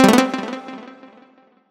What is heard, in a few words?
combo; quatuor